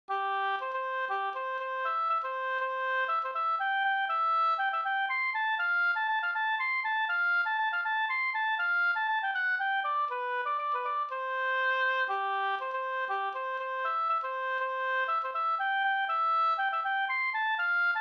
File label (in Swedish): polska Prastpolska